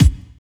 BD, Drum & Bass, 145 BPM; Ueberschall; Drum & Bass Flash Trackz

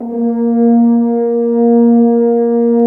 BRS, FHns Dry; Roland L-CD702; F.Horns 2

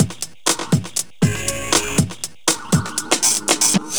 jungle tech, Breaks

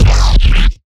Growl Bass; neuro; BIG5AUDIO

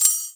SHAKER, INDIAN PERC

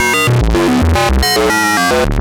Random, LFO & Noise

